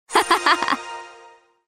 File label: win queen; redvsblack